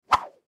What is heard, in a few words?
Cable Whip Whoosh; foley; Whip Sound Effects; Objects; Blastwave